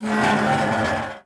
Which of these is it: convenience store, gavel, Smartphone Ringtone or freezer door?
freezer door